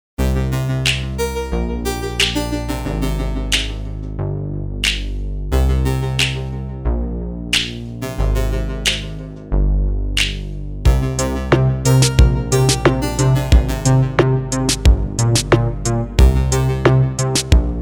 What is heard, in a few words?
Highpass